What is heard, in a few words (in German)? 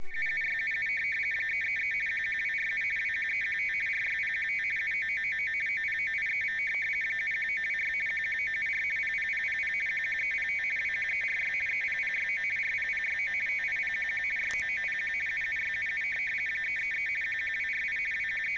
RTTY mit Preselektor